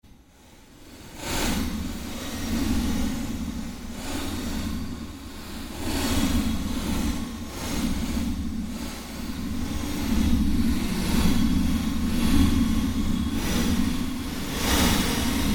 ambience fluctuation, Sound effects created by manipulating other sounds; Rise And Dive; Rises and Dives